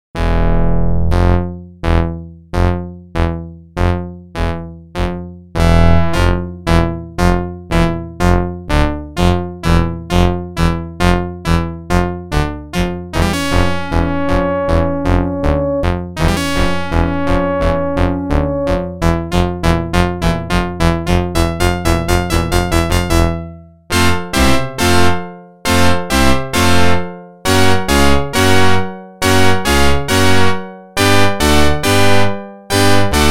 Organ; NHL 94

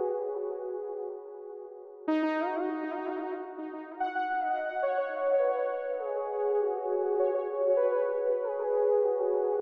Synth Layer

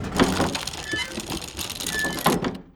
wood stick use

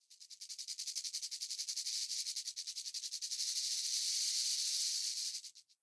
Rattlesnake; The Town; 3D Environment

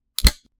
Switch

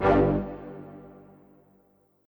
speech add

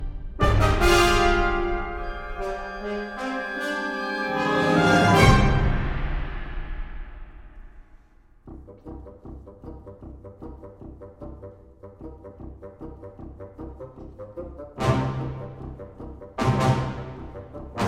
Battle Medium Layer; The Dwarves 2016 ps4 gamerip

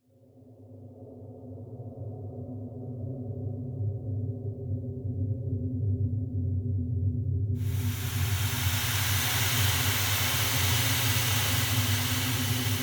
Sewers Ambient; Untold Legends Dark Kingdom; PlayStation 3

